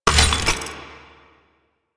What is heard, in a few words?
Oil Grenade Ready; Warframe; Vehicles; NechroMech; Abilities